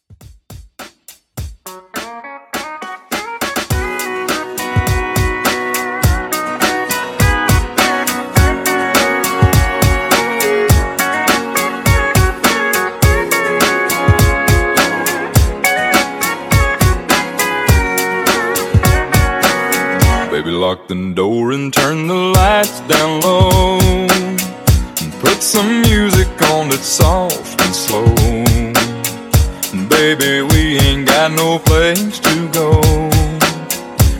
ReDrum Country Rock 103 BPM

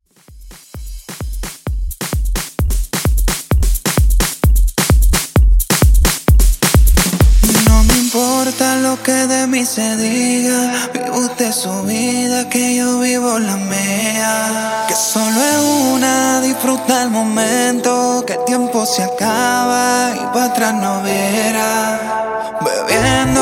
Dancehall, 130 Bpm; Mixshow

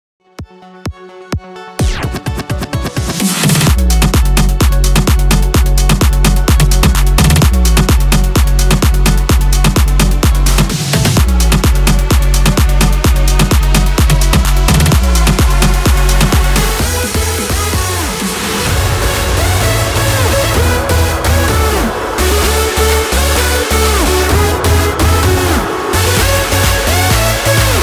cut 128 bpm

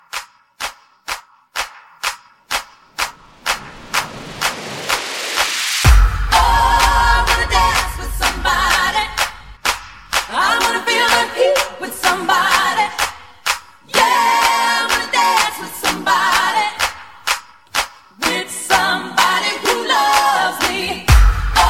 Clapapella Party Starter

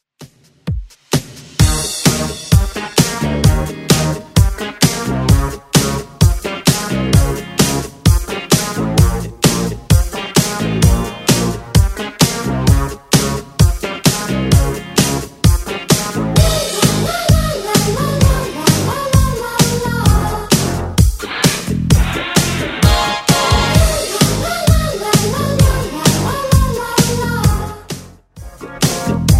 80s 128bpm; Redrum

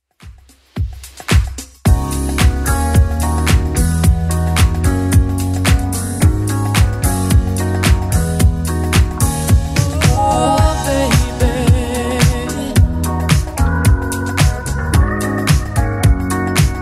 80s Club 110pm; 80s Club Edit

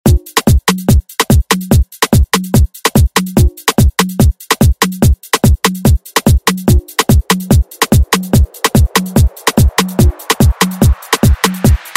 Latin Urban Redrum; Deejay Hit

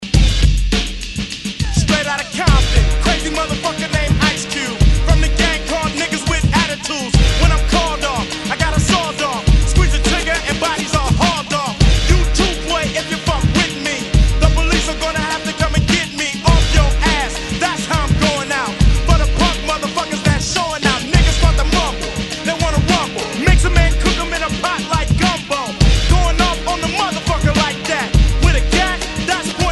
80s 103 Bpm

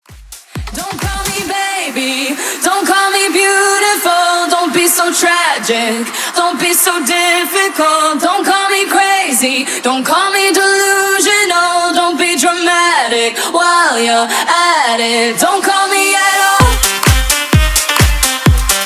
Vocal Out Club Dance 129 BPM

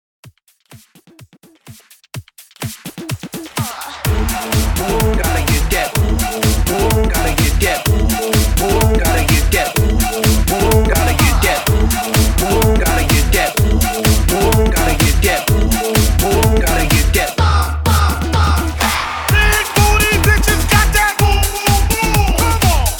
Hype Redrum 126 BPM

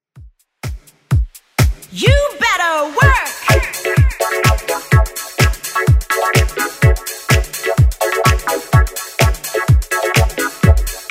80s Redrum 126bpm

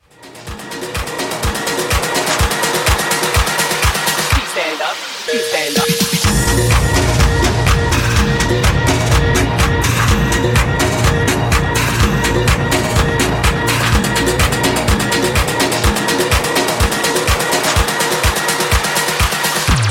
Tokyo Bootleg Mashup 125 Bpm

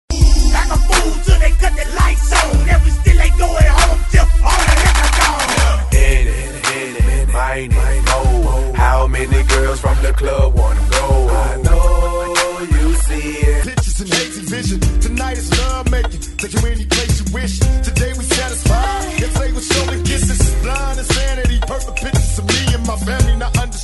Throwback Hip Hop N RNB Megamix

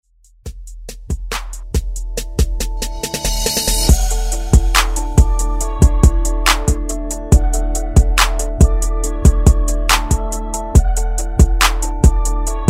Top40 Ext Redrum

